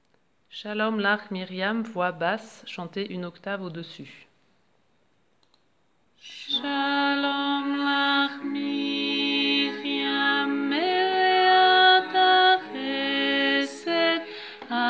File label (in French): basse